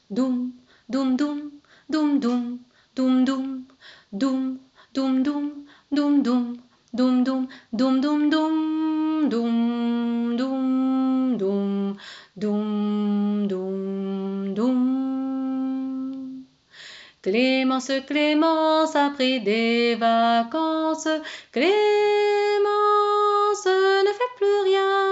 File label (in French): voix